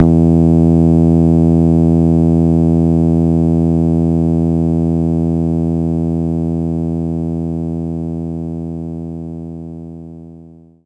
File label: bass guitar E2